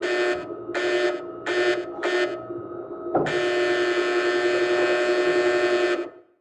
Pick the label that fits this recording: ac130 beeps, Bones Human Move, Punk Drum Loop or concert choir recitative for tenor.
ac130 beeps